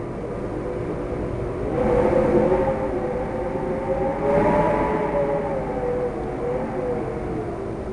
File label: demon wind